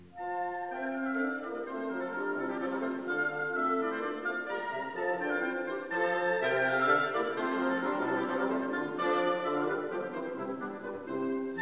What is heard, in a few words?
Water Music Suite Hornpipe; Handel; Karajan